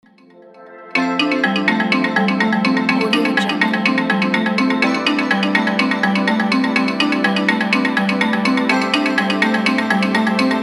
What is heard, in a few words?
japan